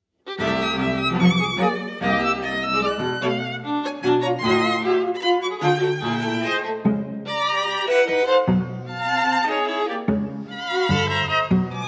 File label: string quartet rondo molto moderato